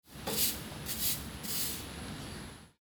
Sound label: Road Sweeper